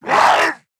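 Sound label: pain, zombie male; nmr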